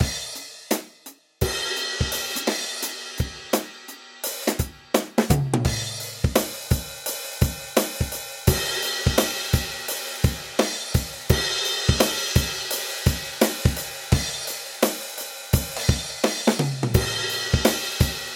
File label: drum comp